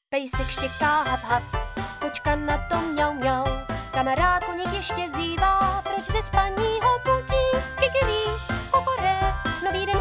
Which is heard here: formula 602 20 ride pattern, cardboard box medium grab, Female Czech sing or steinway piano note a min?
Female Czech sing